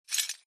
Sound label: imp bullet lightballistic tile debris